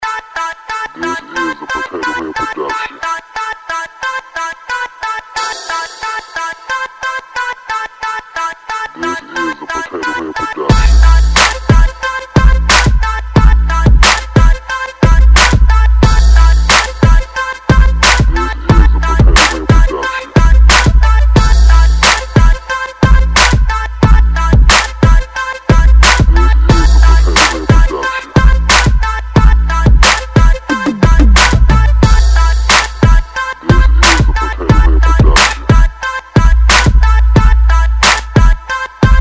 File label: Beat